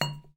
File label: object drop bottle